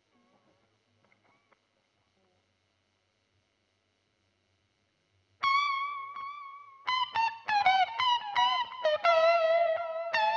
GTR Royer

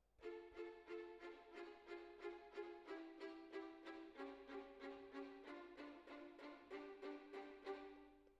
Cello Solo

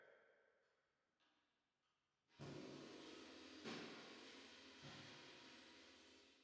Drums Verb

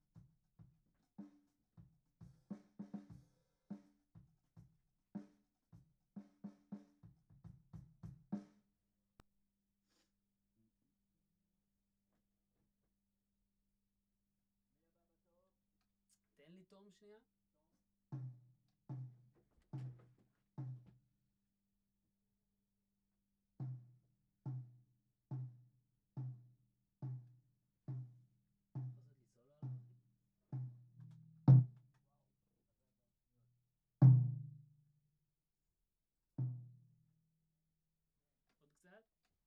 VOC GUIDE

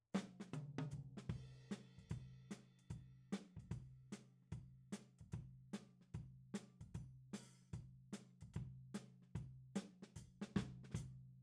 Tom

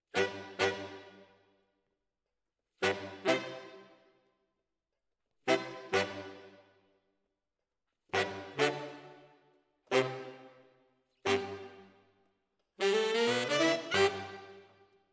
ORTF SCHOEPS, piano

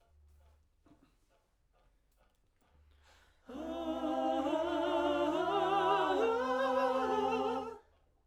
Backs SCHOEPS

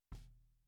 SNR Bot

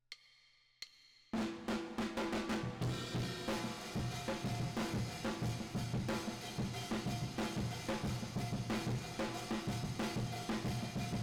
Drums Verb

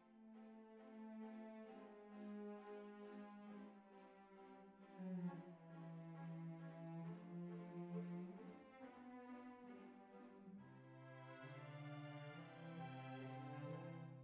Cbasses

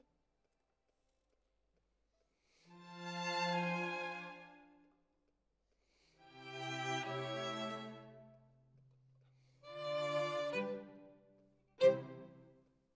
Violin Solo

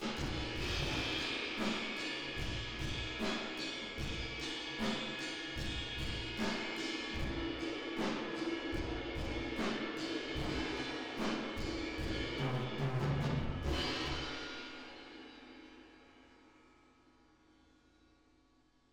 Drums Verb

